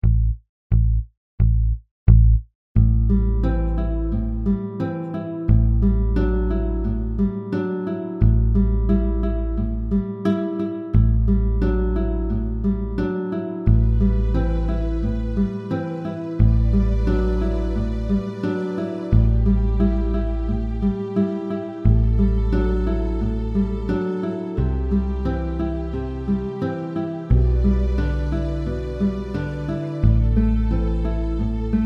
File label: Amin finger picking